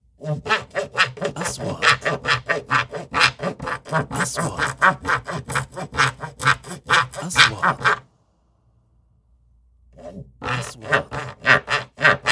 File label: Wood